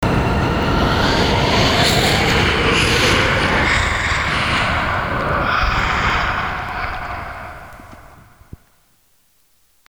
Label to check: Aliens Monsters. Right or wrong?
Right